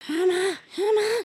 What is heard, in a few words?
dice roll; emotes